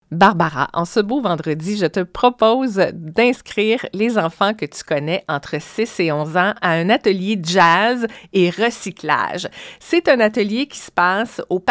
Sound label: Jazz; atelier jazz et recyclage